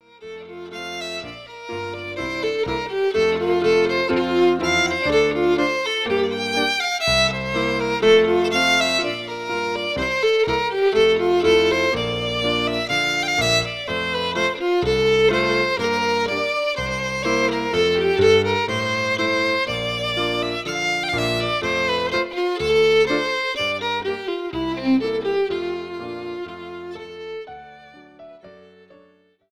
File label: Strathspeys